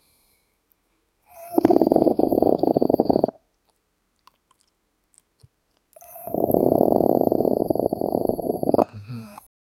an ugly black small creat; birth

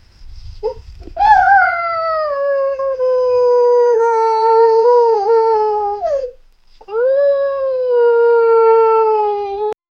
small abandoned puppy cry; emotional

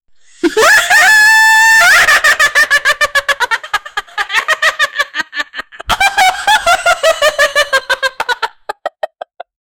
laughting meme

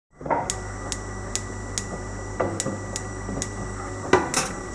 sound of cooking in the, boiling

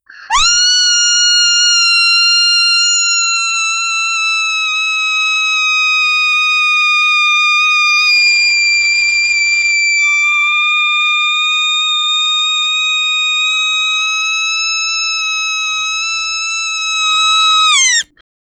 a paniced little kid scre

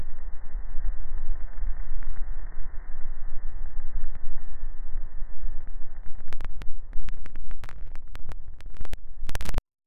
walls melting a low crack

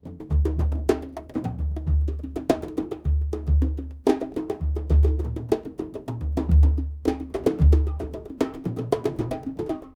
folk African drums calm

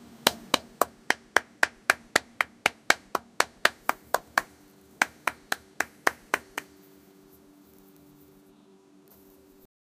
plapping sound

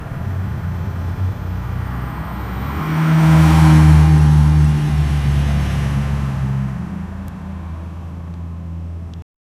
sound between two car collisions

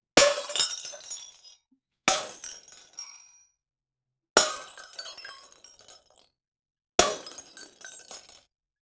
series of soft glass bulb, break

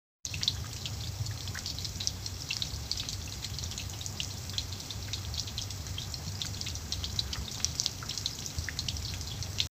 steady rain in a rural

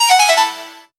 game upgrading level up; achievement

